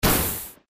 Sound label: jungle